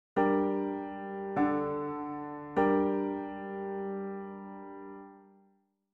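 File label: G minor perfect authentic cadence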